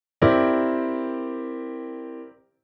dissonance C9

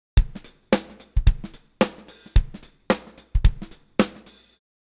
Swat Swing

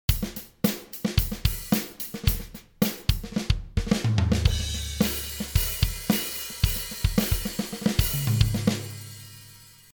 Saturator Drum; Abbey Road

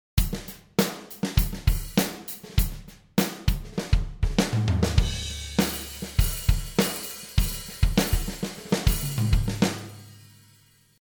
width drum; shaperbox

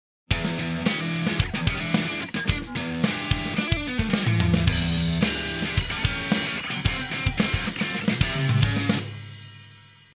Abbey Road Saturator

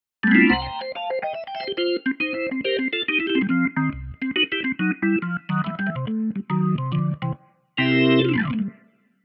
Tonewheel Organ M3

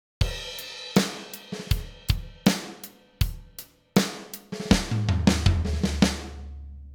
drum DECAPITATOR

